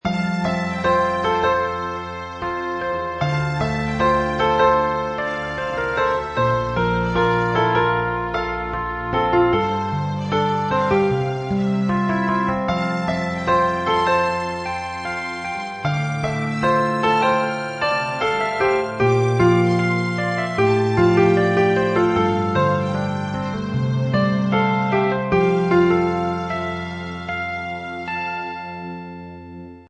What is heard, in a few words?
Ring Tender; Asus P526